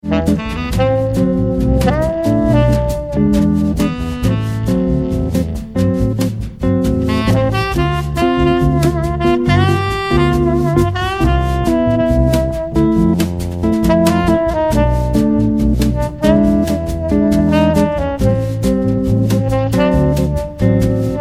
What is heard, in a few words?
bossa, sax